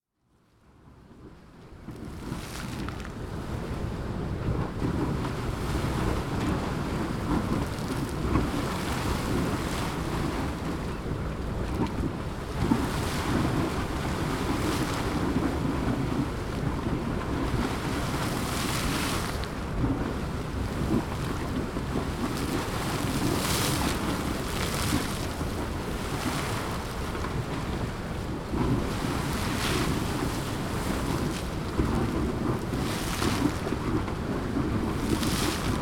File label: Grotto Geyser